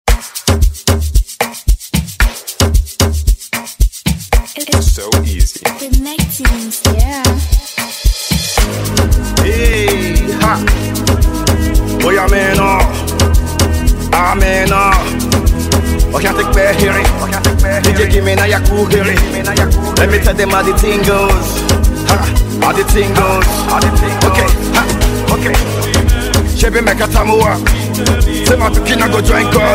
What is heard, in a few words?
Amapiano